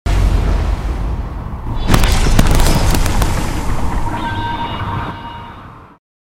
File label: landing sound; murder drones